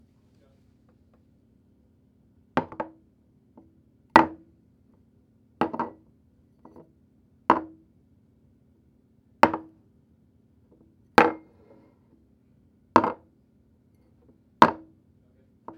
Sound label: Ceramic Placed On Wood Surface